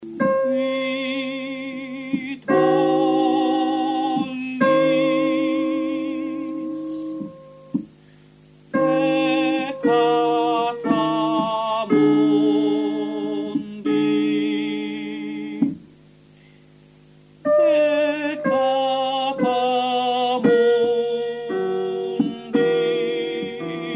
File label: Qui tollis Soprani; Vivaldi Gloria